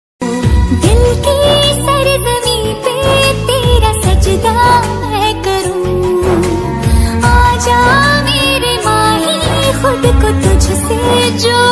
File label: love ringtone, romantic ringtone, hindi ringtone